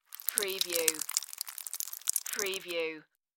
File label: Blood Spill Drips; sound-effects-bible